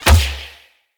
Hunter Bow Ranged Shot; Weapons; Player; Bows; EnvoyHunterBowRangedMelee

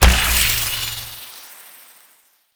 Globelight Turn On; Warframe; Zariman

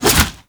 Rifle Swing; Warframe; Lasrian Soldiers; Weapons; Lasrian Lancer Rifle

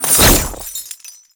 Chain Detach; Roathe Summon Catenach; Warframe; Tau

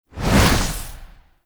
Fuel Projectile Throw; Warframe; Jade Shadows; Ascension

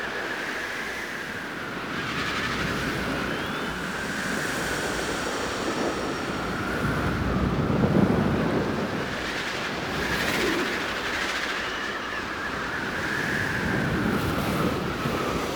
Gas City Large Exterior Wind Amb; Warframe; Corpus

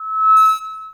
Mall Stage Feedback; Hub; Warframe; Ambience; Nineteen Ninety Nine

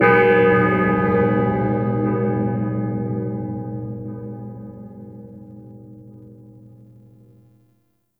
Amphitheatre Bell; Warframe; Duviri; Ambience

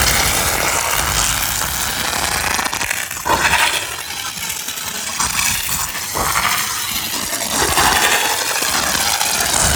Arch Gun Primary Beam Impact Loop; Weapons; Warframe; Archwing; Hildryn

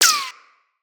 Bullet Impact Ricochet

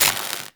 Worker Welder Start; Warframe; Grineer

